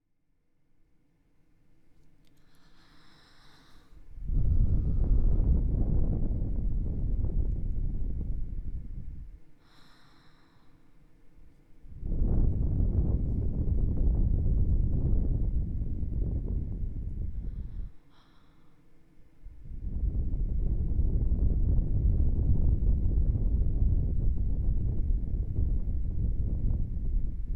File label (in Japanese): 耳ふーはー 両耳